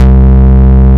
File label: Bass Note C; House; Best Service; House Definition VOL-1; Basses